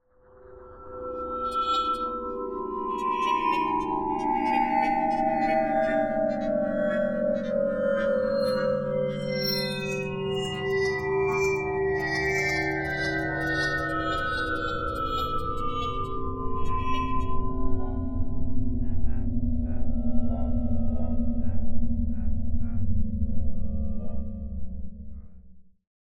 Inside Track Long Swirls

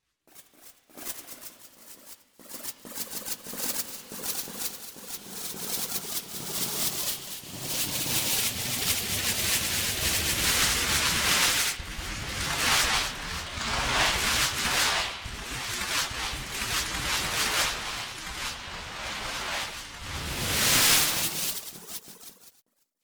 In Flight Sparse to Thick

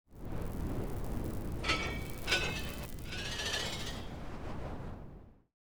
crunch zones 3D

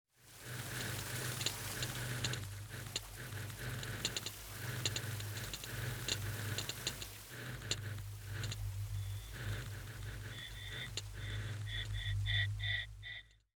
time clicks fire

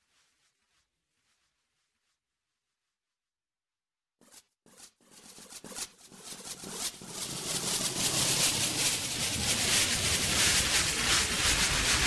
In Flight Sparse to Thick

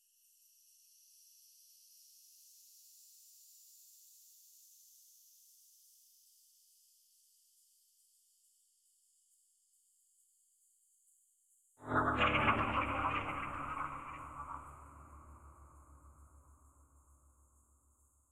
crunch strata

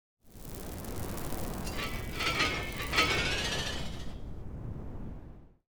crunch zones 3D

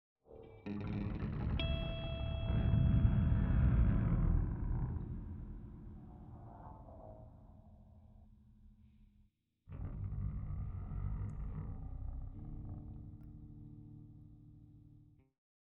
Guitar Piece

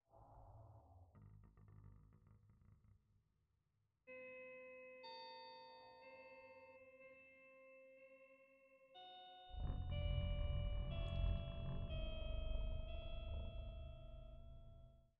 Guitar Piece

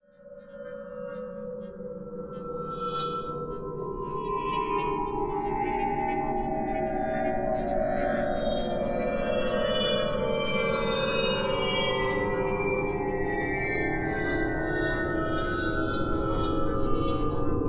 Inside Track Long Swirls

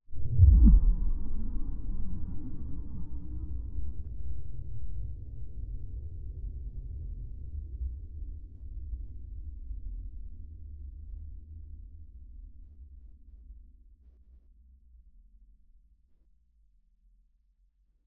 crunch woomph

